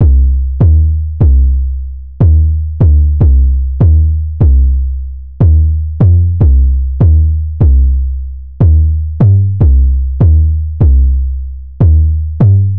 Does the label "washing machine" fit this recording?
No